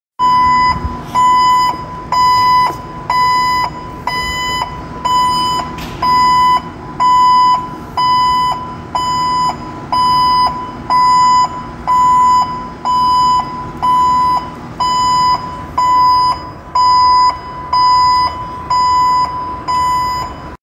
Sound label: Forklift